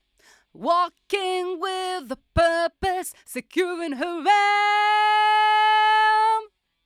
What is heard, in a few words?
Vocal